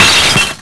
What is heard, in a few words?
crash; Amiga; fred fish; RadBoogie